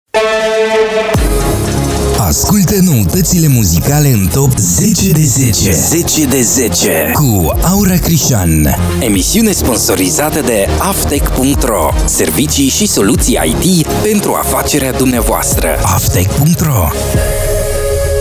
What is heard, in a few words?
Jingle Sponsor